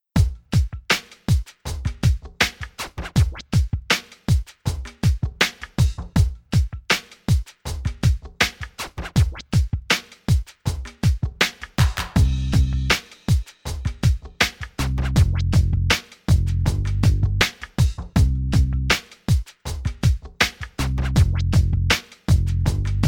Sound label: Zebra Rap instrumental